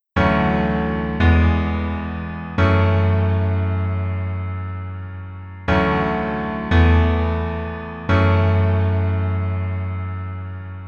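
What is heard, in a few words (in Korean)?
Piano version -1키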